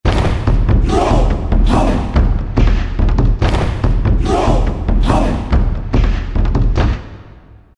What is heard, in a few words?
Yoh-How; World; Roland SR-JV80-05